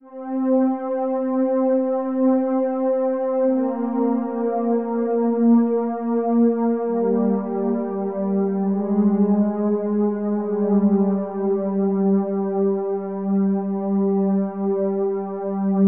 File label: tenor